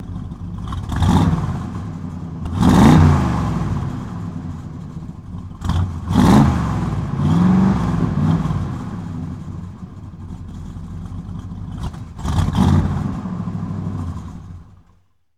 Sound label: 68 Road Runner Rev Off; Automobiles